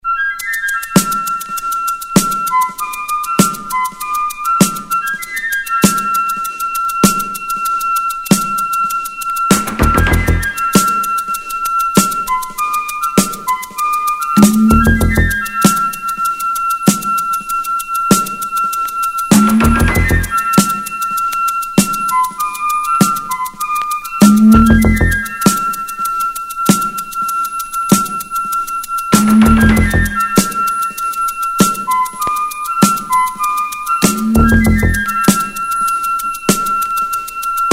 Disco

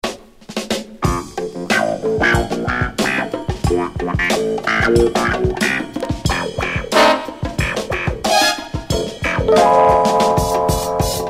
Clavinette Instrumental

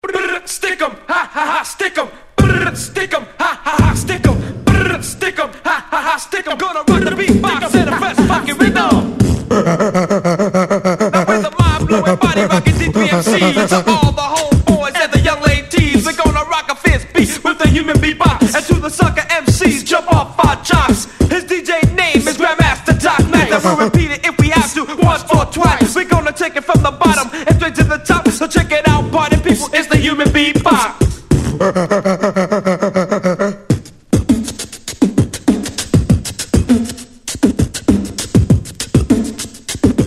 Human Beat Box

